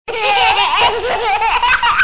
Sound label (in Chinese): 小孩乐坏了的笑声